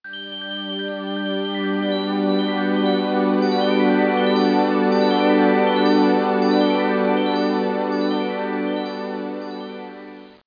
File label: Music, 片头音乐; 特酷声音素材库